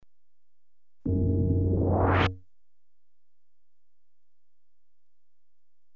Sliding door (medium with mechanism hum), Electronically Generated Sounds; Sound Effects Library